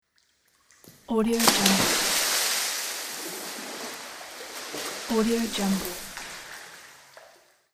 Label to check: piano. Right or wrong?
Wrong